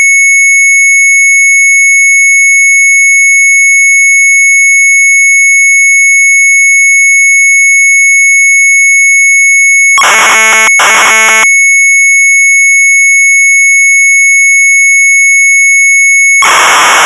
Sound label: MZ800 Tape, sharp; WINDOW DEMO; retromelsarchive